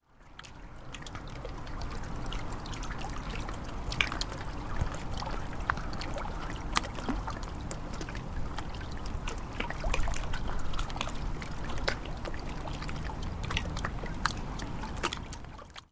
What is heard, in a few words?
Waves At The Dock